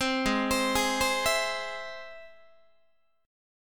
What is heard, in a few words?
G sharp Augmented, Guitar; G#+ arpeggiated; 15,16,15,18,16,15; JGuitar